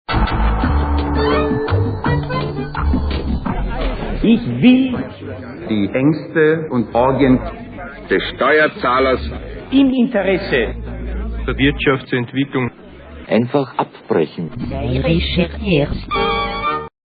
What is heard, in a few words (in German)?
der jingle